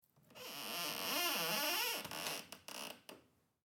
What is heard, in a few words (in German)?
Badschrank